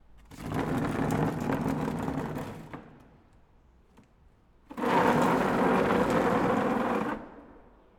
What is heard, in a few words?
Plastic Chair Dragging In And Out